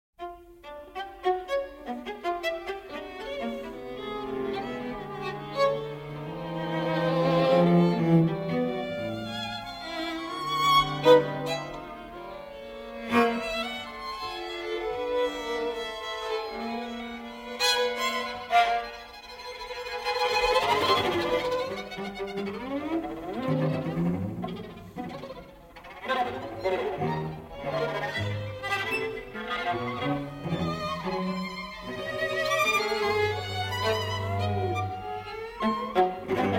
Quatuor, Etudes, Bowing; Quatuor III; 9 Etudes

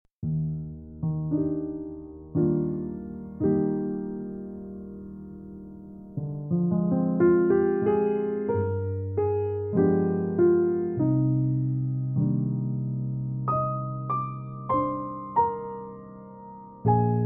Smooth Piano Jazz

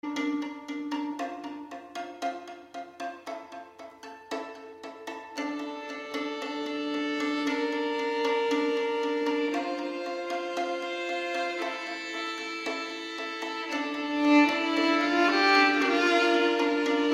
Strings

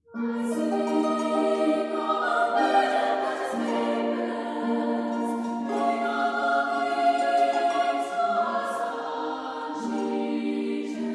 Choir

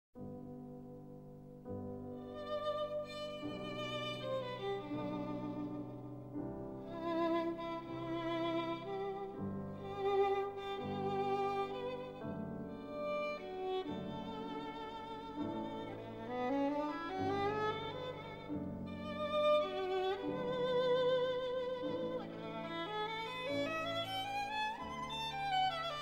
Violin Sonata in G Major, Vivace Ma Non Troppo; Brahms; Violin Sonata No. 1; Op. 78

